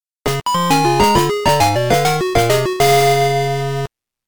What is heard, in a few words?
Fanfare!